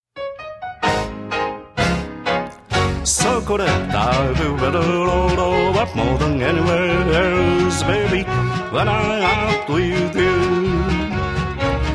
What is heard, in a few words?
i feel better vocal